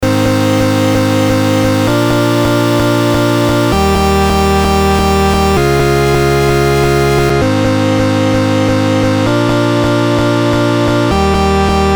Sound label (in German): SP12 CHORDS Nur Frequenz